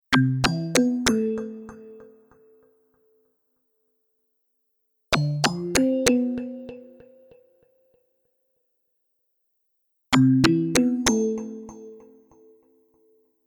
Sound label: only melody